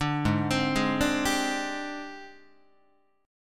Ab Major 7th Flat 5th Ab, down, Guitar; AbM7b5 arpeggiated

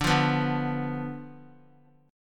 D Minor 6th Guitar; Dm6 strummed; JGuitar

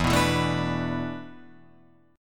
E 7th Suspended 4th E down Guitar Open F; E7sus4; strummed

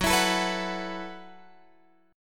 F sharp Minor 11th, Guitar, Open F; F#m11 strummed; x,13,16,16,14,13

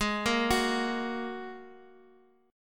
G sharp 7th Suspended 2nd G sharp, down; arpeggiated; chord

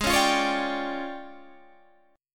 D Minor Major 7th Double Flat 5th, G sharp

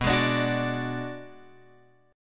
B Minor 6th B, Guitar Open F; Bm6 strummed; JGuitar

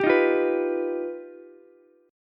G Augmented 7th, Ukulele; G+7 strummed; JGuitar

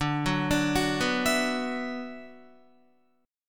D 6th Add 9th, Guitar; arpeggiated; 10,9,12,11,11,11; 4E,4A,5D,5G,5C,6F; chord

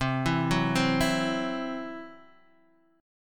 C-Major Flat 5th, Guitar Open D

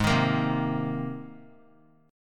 Ab Major Add 9th Ab; Abadd9 strummed; JGuitar

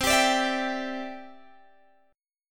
C-Major Add 9th, Guitar Open G

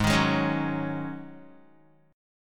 Ab 7th Suspended 4th Ab down Guitar

